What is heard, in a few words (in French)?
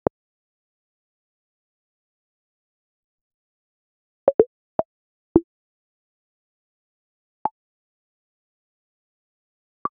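bruit de bulle qui éclate, bubble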